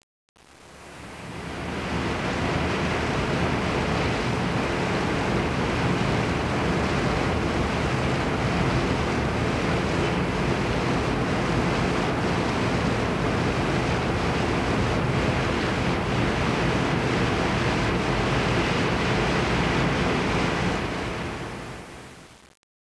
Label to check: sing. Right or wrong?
Wrong